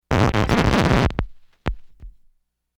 needle record scratch; samples

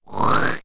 creature slurp

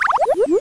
OUT SPIRALING, spiraling short sound

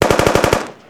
m16 exterior shots; colt

